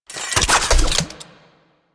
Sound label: Rocket Launcher Shot Reload; Warframe; UT Weapons